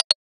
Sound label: switch, notifications